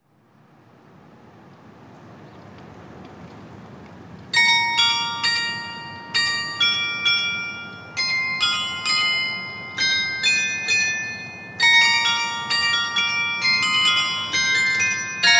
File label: carillon